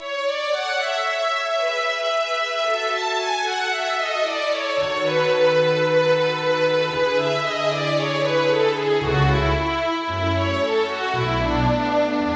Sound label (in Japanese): メロディー